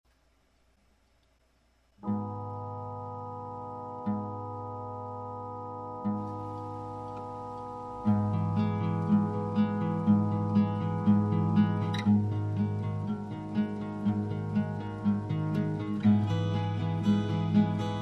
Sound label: instrumental